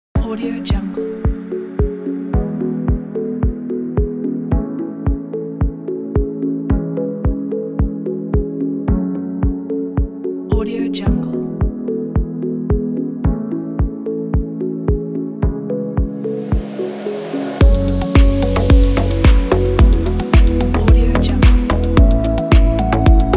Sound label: in chillout